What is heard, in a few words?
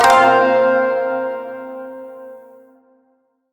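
Shawzin Pentatonic Minor Chord K; Chords; Warframe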